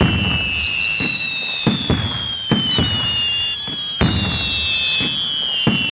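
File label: fireworks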